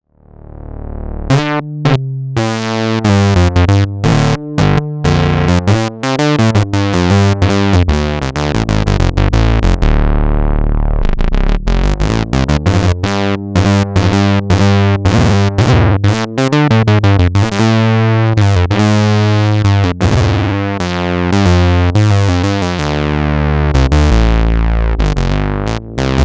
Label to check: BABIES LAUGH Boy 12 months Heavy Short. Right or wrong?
Wrong